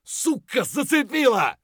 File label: hurt medium; Escape from Tarkov